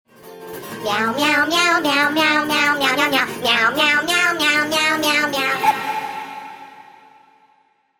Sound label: meow